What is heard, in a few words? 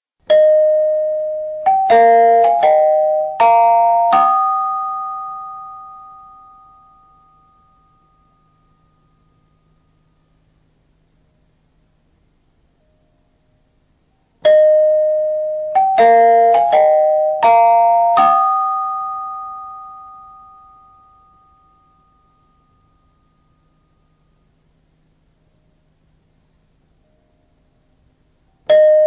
interval signal; sr1